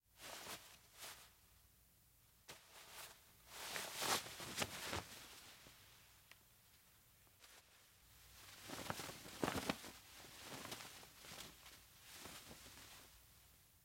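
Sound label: Cloth Flaps Heavy Light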